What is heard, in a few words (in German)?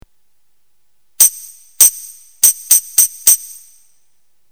tamburin